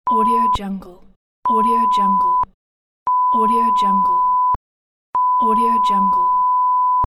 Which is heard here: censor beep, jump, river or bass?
censor beep